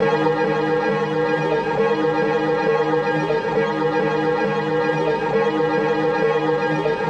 PHRASES 135 G Minor; Accentum; BBoy Maniac; bboy accents phrases

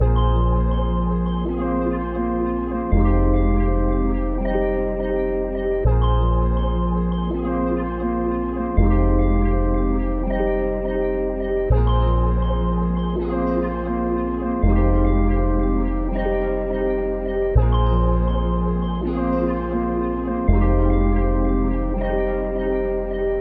PHRASES 164 F Minor; Plan B; BBoy Maniac; bboy accents phrases

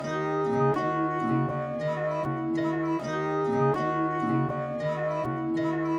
PHRASE 160BPM Em; Greek; raevn creative soundscapes

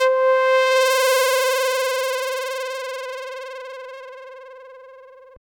Sytnh Roll; Stashes NBA; BBoy Maniac

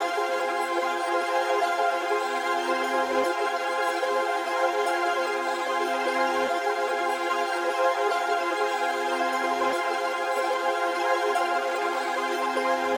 PHRASES 148 F Minor; Emerald; BBoy Maniac; bboy accents phrases

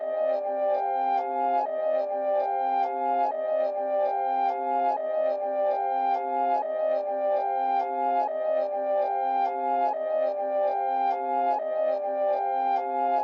TEXTURES Tape 145 C Minor; BBoy Maniac; bboy accents phrases

